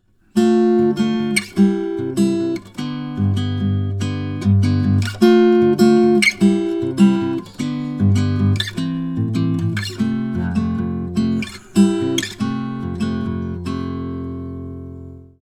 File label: Spread triad, guitar; Spread chords example 3